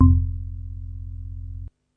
F1, Two Tone House Organ

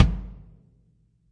real kick; REAL LIVE KITS; A Real Freakin Drum Kit

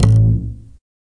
Upright Bass Notes

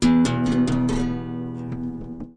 open guitar tapping

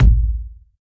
kick

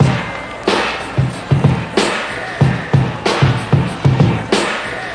93 bpm clang the bang bang, drum loops and breaks; 091 to 100 bpm

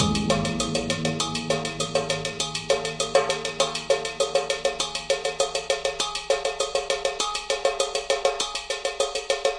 100 pile of junk clangers, drum loops and breaks; 091 to 100 bpm

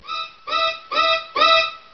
Psycho Strings

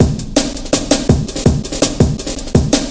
general march, drum loops and breaks, 165 bpm

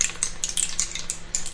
rainstick single clicks; ETHNIC and WORLD PERCUSSION

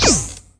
zap rattle, Electronic Hits

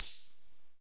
T6 Hats; DRUM MACHINES

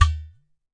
udu HIT, Udu Clay Hand Drum; ETHNIC and WORLD PERCUSSION

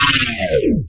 fx spin down, electronic hits

